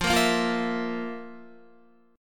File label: F Major 7th Suspended 4th F, Guitar; FM7sus4 strummed; JGuitar